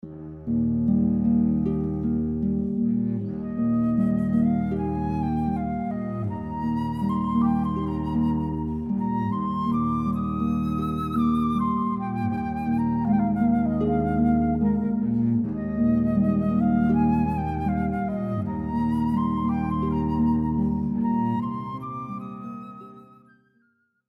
Carmen theme, harp flute cello; Bizet

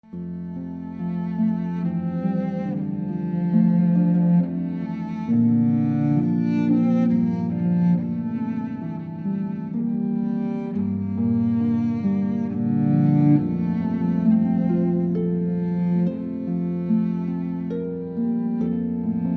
Intermezzo, Cavaleri Rusticana, harp flute cello

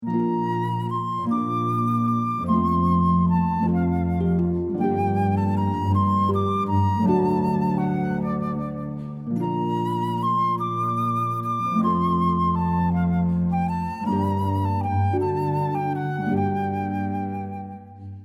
Greensleeves Variations, harp flute cello

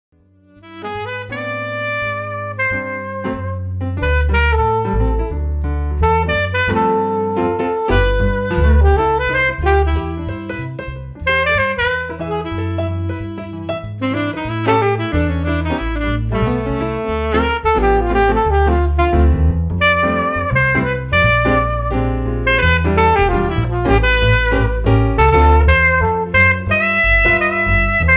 Keyboard and Sax Jazz

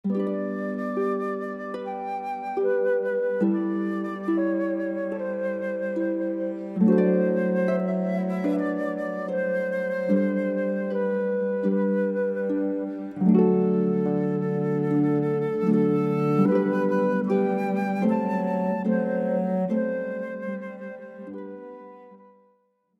Ave Verum Corpus, Mozart, harp flute cello

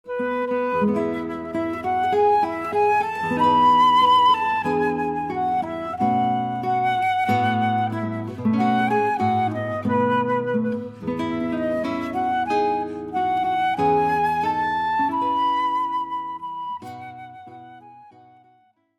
Simple Gifts, guitar flute bass trio

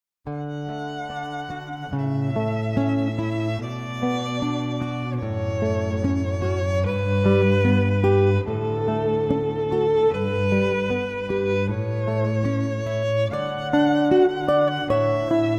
Trio of Guitar; guitar violin cello; Canon, Pachelbel

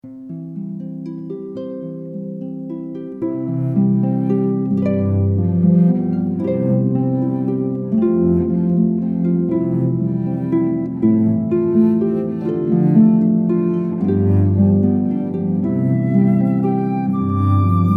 harp flute cello

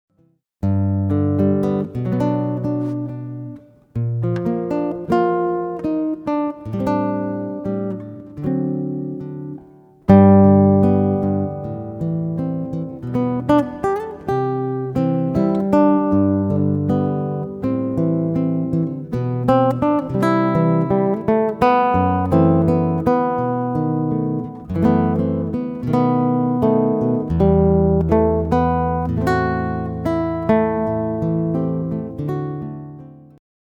Guit

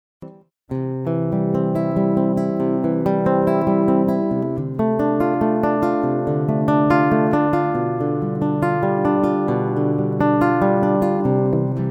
Guitar Solo; Prelude, Bach

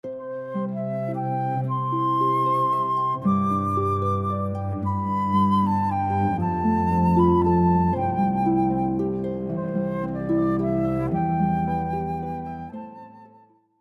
Morning Has Broken, harp flute cello

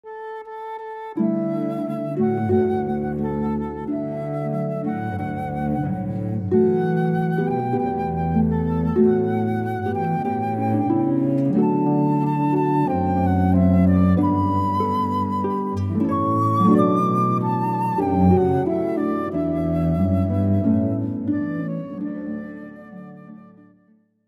harp flute cello

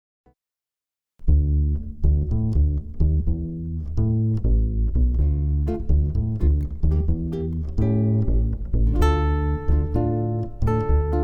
Jazz Duo of Gui; Jazz Duo of Guitar; Lyrica Demo

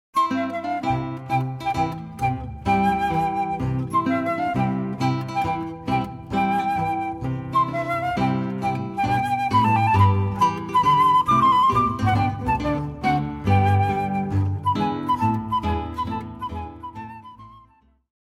Wedding March, guitar flute bass trio

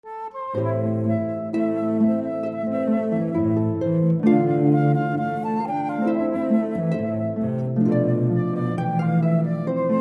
Ose Shalom, traditional Jewish, harp flute cello

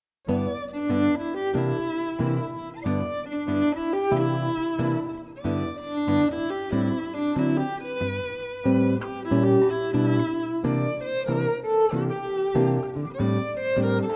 Duo of Guitar; guitar violin

